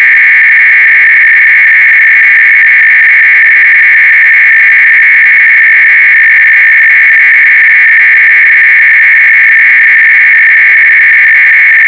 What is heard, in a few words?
sine